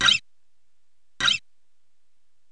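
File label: fret noise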